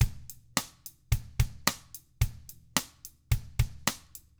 POP, Drums

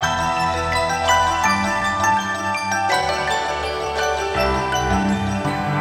Synth Lo Fi Lament; Sonic Foundry (Sony Creative Software); Knutrix Drum & Bass

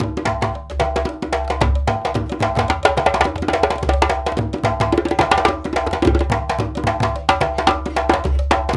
Lamba, African Contemporary; Spectrasonics; Supreme Beats

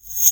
REV, SOUND EFFECT REVERSE

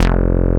RESO BASS

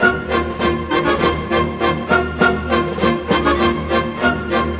Modern Orchestra; Sonic Foundry (Sony Creative Software) - Crimson Blue and Fabulous Horncraft 4 RnB

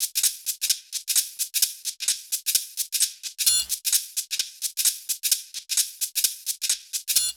Sh-Tr Loop 130, Shake Loops; Equipped Music; Loungin House; Hh-Shake Loops 130

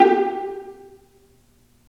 STR PI, Roland String Master Series, Vlas Pizz

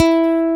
E3, EL-GUT

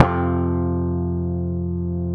F, Bass; Sampleheads; Will Lee Bass Library

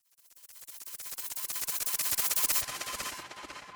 Swept Noise

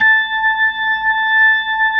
A5S, B3

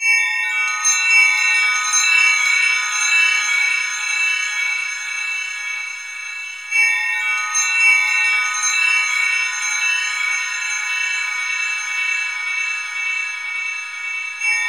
BELL TREES; Zero-G Ambient 1; AMBIBELLTREE

